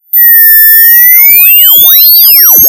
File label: Noise But Sms